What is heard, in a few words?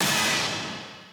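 b stab1b, note G octave 5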